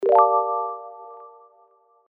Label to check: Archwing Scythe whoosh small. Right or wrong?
Wrong